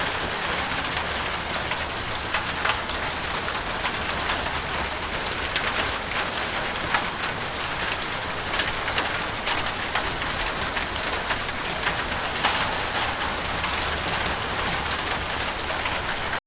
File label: rain inside